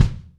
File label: Kick Drum